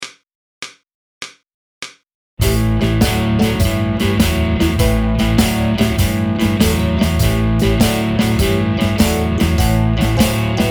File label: blues in a